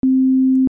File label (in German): sinus, synthesizer basics